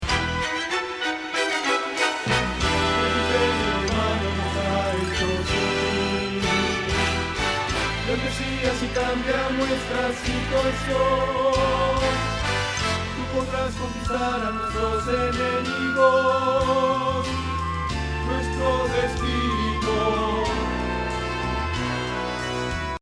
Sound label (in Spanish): Bajo